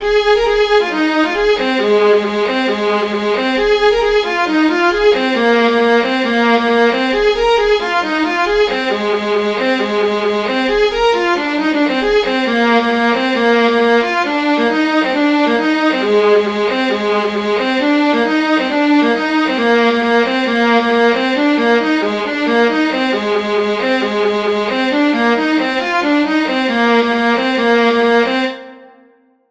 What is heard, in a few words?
Strathspey